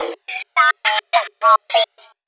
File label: Turntables